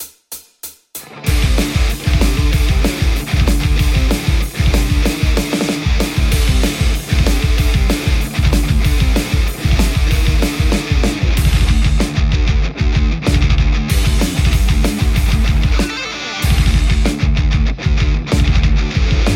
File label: metal Les Paul; igniteamps emissary v1 00